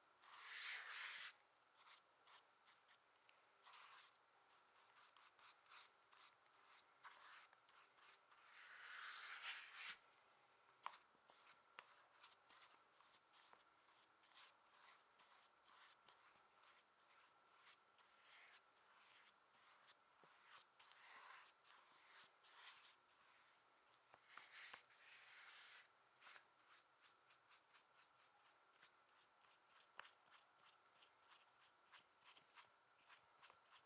Painting On Paper

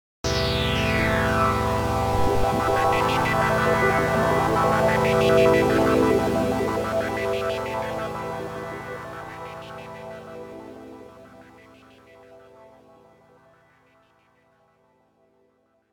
Big Intro

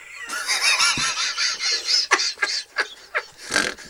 Laughter Male; Muttley Style

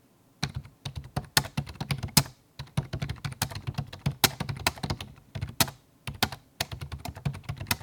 Keyboard Fast Typing Accents

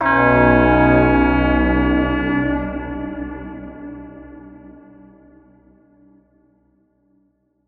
Alien Horn; Cuboos

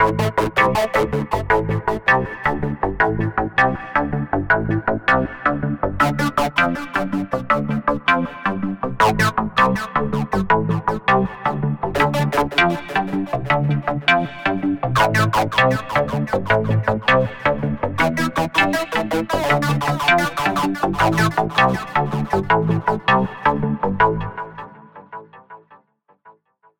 Signature Perc Intro

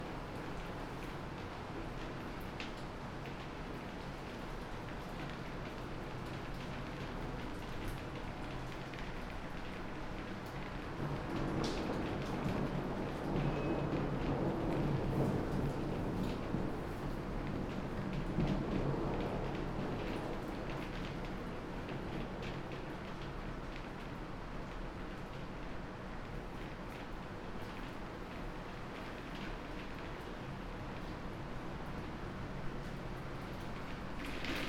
Lightning Strike

